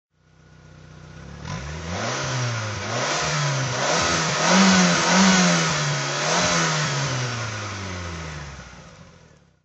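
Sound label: lotus elan se throttle, Engine sound; Lotus Elan SE, 1992; V3D/ ClassicarImages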